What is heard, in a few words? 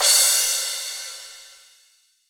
CRASH, HR-16 Drum Mashines; EdgeSounds